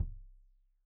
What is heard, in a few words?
Kik Stereo; Deeply Sampled Drums; SMDrum